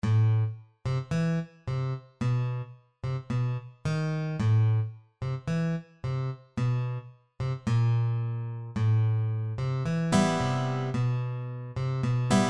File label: Mysterious Tango